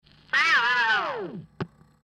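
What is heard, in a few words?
record sound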